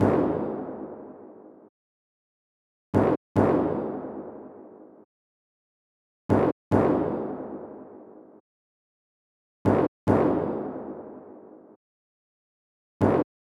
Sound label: 143 BPM C# Min Hit; Rome